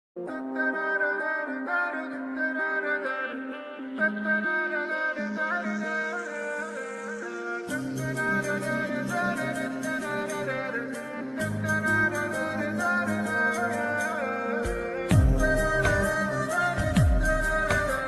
background music ringtone love ringtone hindi ringtone